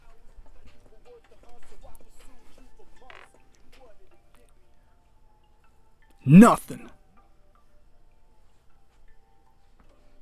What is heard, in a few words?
Verse Dubs